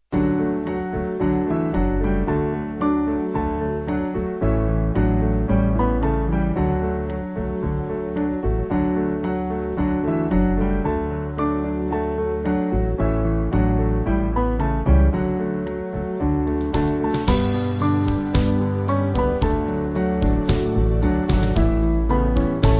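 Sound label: Band, Music Backing; Saviour Like A Shepherd; Bradbury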